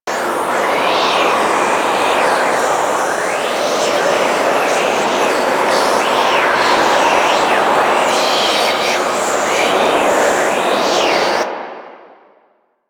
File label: Loop; DE; Warframe; Weapons; Archwing; Powers; Archwing Healer